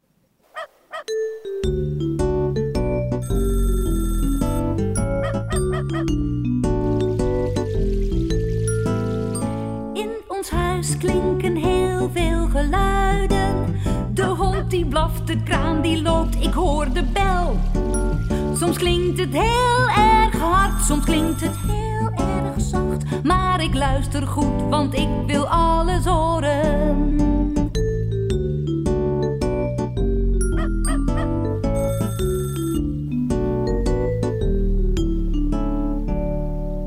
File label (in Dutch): in ons huis gezongen versie; muziek onze stad groep 1 2